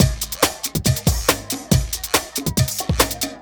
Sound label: Break Beats, 140 BPM; Loops 140 BPM; Vengeance - Essential Clubsounds Vol.4